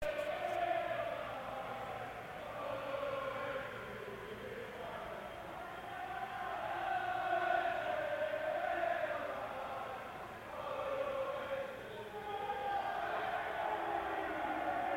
football chants from the pub reverberating off the wall behind my flat